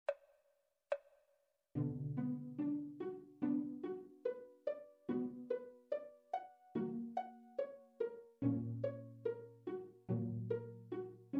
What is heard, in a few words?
flute qartet; mozart; kv285